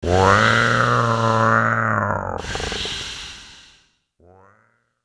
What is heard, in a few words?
Aliens Monsters; FXHome